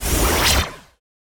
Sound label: laser; spacemap; lasers